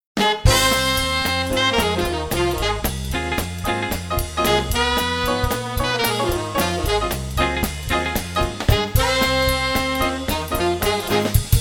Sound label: Swing Orchestra